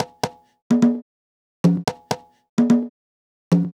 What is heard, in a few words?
percussion; Sonic Foundry (Sony Creative Software); Afterhours EDM; Retro Fit